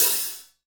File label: hi-hat; Roland L-CDX-01